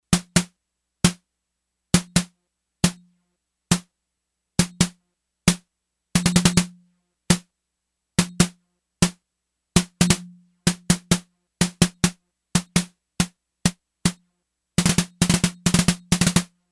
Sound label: Snare